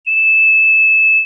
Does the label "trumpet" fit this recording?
Yes